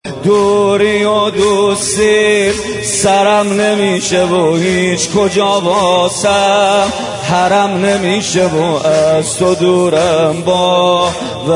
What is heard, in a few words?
Mobile ringtone